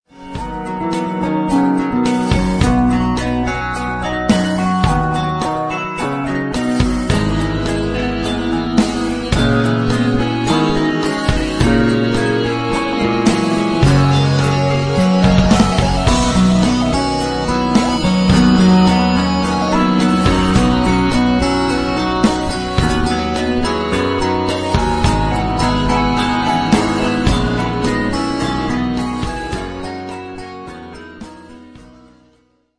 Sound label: romantic